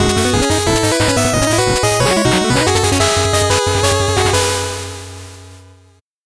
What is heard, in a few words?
8bit jingle fast